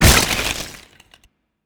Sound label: Enemy Die; helltaker